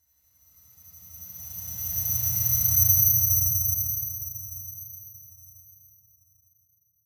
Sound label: Ring High Harsh Passing Tones Like Ear Ringing - Bowed Chime; Hollywood Edge; sound designer's toolkit v2